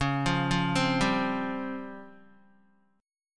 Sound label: C sharp-Major 7th Flat 5th-C sharp-8,8,5,5,7,x-8-down-Guitar; C#M7b5 arpeggiated; JGuitar